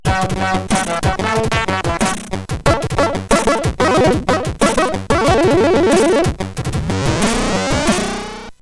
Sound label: Jingle, Commodore 64; Afterburner